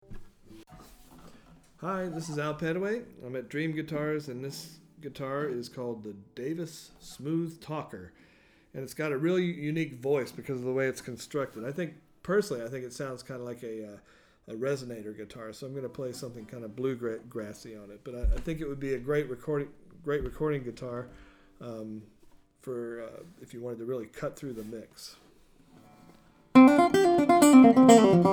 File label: Smooth Talker SSC2, African Kiaat/Sitka; New Mervyn Davis Smooth Talker SSC2 African Kiaat/Sitka